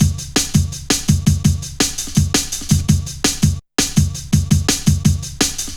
Fat Rolla, Jungle Drum Loops 166.5

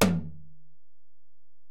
Tom, Alesis DM-PRO

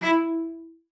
E4, CELLO SPICC; Xsample VOL-5

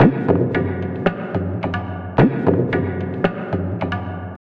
Tabla, 110 BPM; Zero-G; Pure Tabla

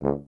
BRS TUBA, Solo Instruments

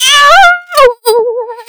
mask filter, Funky Vocals, autotuned; USB Soundscan vol.18; AKAI

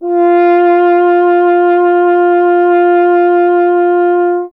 F. Horn; Miroslav Vitous - Solo Instruments VOL-2; Solo lnst. II

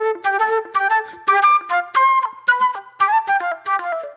Flute, Disco

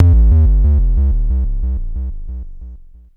KLONE BASS LFO, Klone Dual-Percussion-Synthesiser; Drum Machines

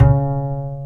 BS UPRIT B, Jazz Bass Ac. Fretless; Roland LCDP02 Guitar and Bass

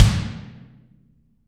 BD, Real Mega Drums

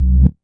Rev Bass; Big Fish Audio; Old Skool House; OSH Bass

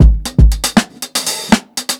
120 16TH GROOVE, DRUMS LOOPS; BASEMENT DRUMS VOL 2

